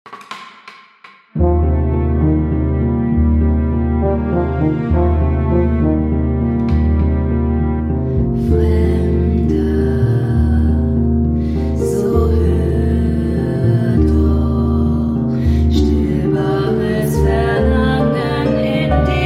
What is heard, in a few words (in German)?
Sirenengesang